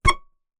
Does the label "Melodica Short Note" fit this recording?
Yes